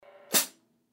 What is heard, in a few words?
Kerope, cymbal sound